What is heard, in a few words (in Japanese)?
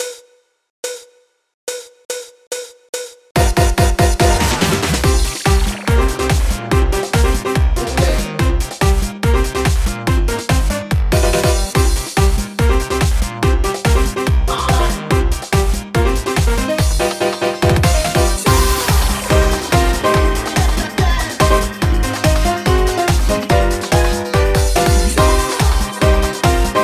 ryoute ensou